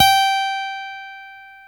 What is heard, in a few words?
STEEL, Guitars; Spectrasonics; Hans Zimmer Guitars VOL-1; PARKER STEEL